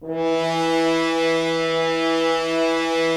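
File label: Solo French Horn, brass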